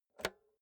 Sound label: insert xlr multi socket hard; objekte; elektronik